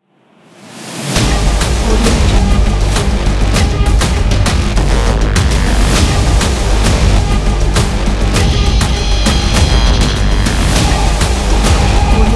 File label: hybrid drums action reveal logo